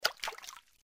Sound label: Fishing Failed; LG GD900 Crystal; Game Sound